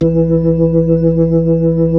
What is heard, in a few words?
B-3, Club